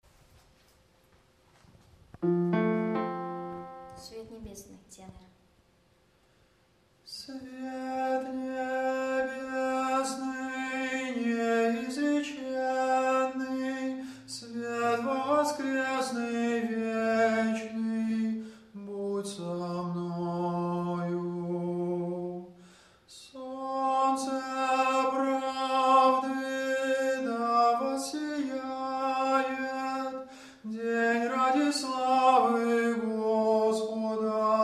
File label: tenor; Svet nebesnyj